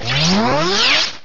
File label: Steamroller; battle anims; PokeRogue